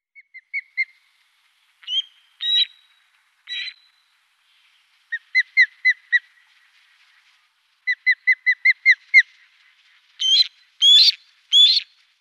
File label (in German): Fischadler, Vogelstimmen